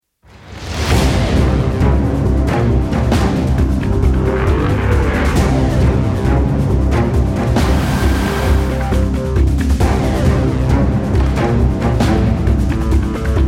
start action

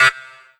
trainyard buzzer oneshot; world misc

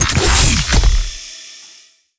move open, combine door; mechanical units

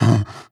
breath short, zombie; creatures

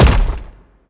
bash soft, brickwall, destruction

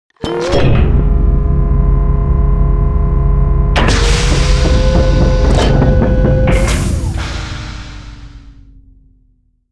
advisor lift, citadel ambient; Half Life 2; Episode 1

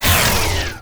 power down; player; grabbity

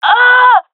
death, gendarmerie fem; vo; agents

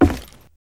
footsteps, wood panel; player

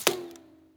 bullet ric; weapons; rics; Counter Strike Model Pack; CS2

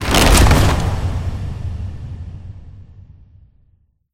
door fall; Half Life Alyx; scripted sequences; vault; clown door

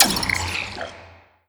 impact, physics, vault

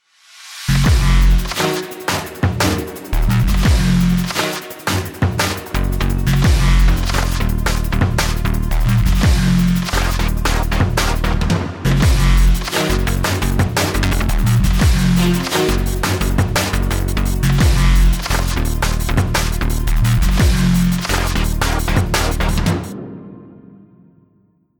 start action, music

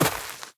step light, footsteps player grass

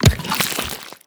bite pull, barnacle creatures; Half Life Alyx

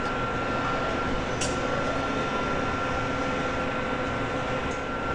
factory loop, ambient atmosphere

